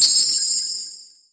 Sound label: broken